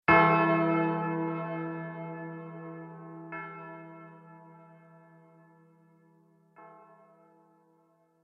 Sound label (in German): glocke ton massage